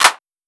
Clap, 808; Slate; Vintage Drum Machines Volume 2 808 Edition

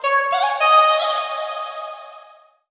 phrase Db, Vocal; Slate Digital Omnivox Vocal Sample Pack

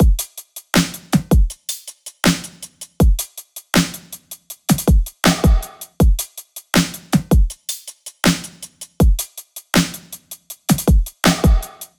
drum loop 80, Pop; Drums; Full Drum Loops; Slate; SD PASADENA Pop Sample Pack

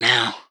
Vocal one shot; Slate Digital Omnivox Vocal Sample Pack

